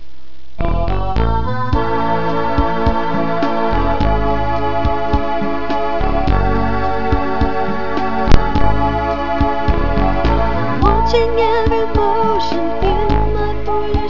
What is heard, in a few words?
breath